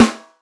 boomy snare drum sample a sharp key, snare drum sample tuned to the A# note